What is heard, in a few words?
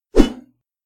sword swing; ragemap2024; grunt